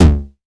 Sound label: 909, DIST; Wizoo - Platinum 24 Electronic; 909 DIST P1